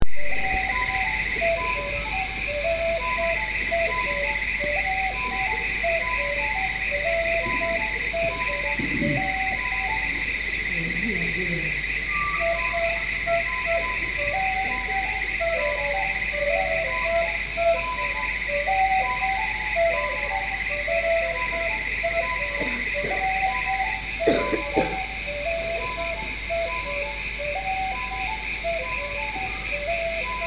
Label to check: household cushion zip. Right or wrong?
Wrong